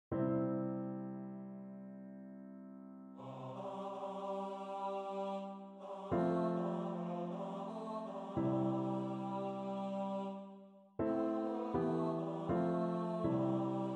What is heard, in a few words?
Kyrie voice piano